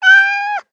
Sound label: cat cry